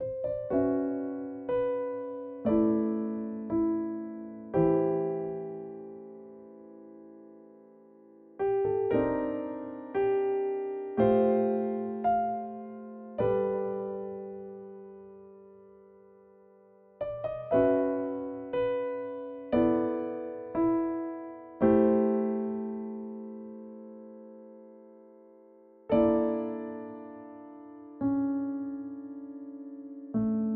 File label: Piano Cover; All the music I made for the Capori.